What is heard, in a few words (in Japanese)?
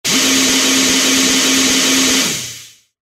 機械の動く音 長め; モノラル